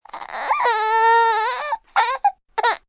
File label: Cry Baby Whimper, Cry, baby, short fuss, pain, hurt, sad (human voice); Crying Sound Effects